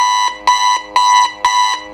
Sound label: alarm; Ueberschall; Techno Trance Essentials